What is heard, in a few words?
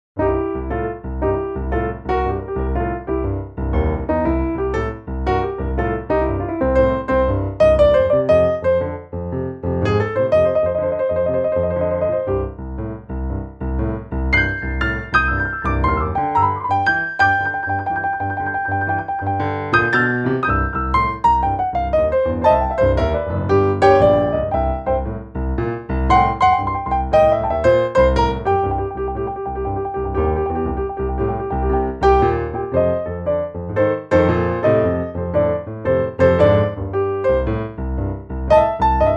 piano